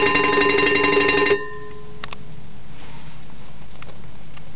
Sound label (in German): Glocke